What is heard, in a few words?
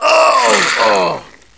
death; quake2; ufops